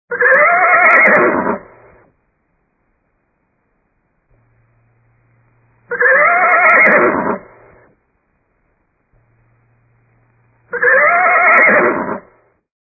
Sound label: Horse Neighing